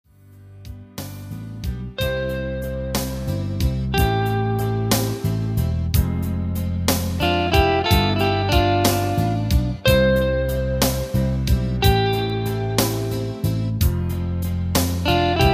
Instrumental

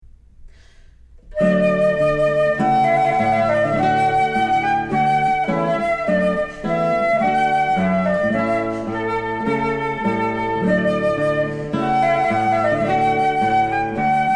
Classical Duo; Clarke; Trumpet Voluntary

